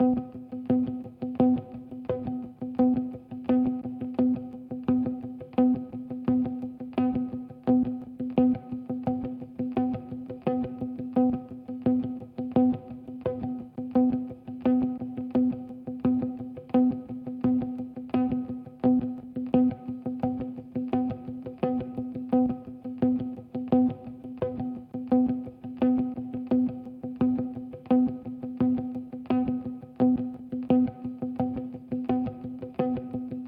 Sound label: 86bpm Layer